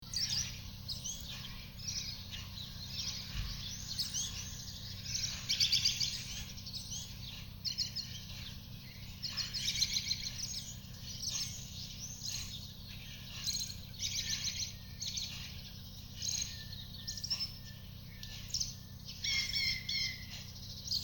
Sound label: morning birds chirping